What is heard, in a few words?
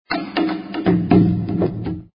Ringtone; BQ Russia BQM 1402 Lyon